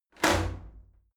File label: shower slide door open hit heavy